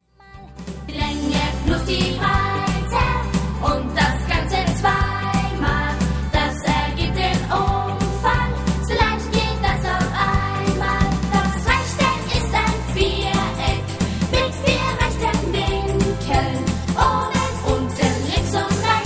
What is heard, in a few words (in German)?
Rechteck - Rock